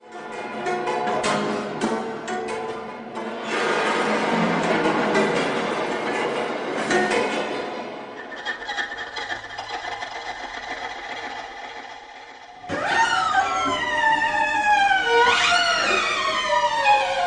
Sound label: jumpscare; insidious